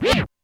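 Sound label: Lo Scratch; Alesis D4; Efx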